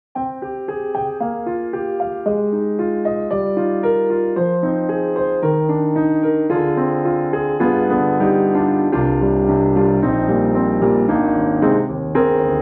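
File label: Studio Grand, piano; XLN Audio; Addictive Keys; Imagine Grand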